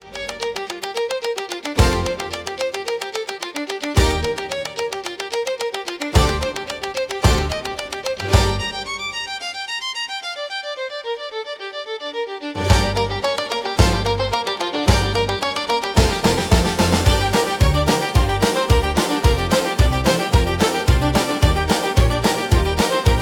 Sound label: dust and horseshoes, wild west music; wild west music pack